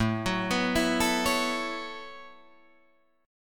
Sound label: A 6th Add 9th, Guitar, Drop D